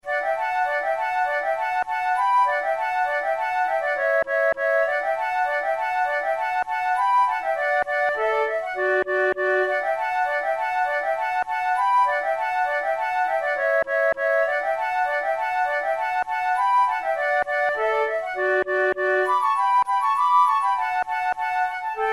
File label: quick step duet, flute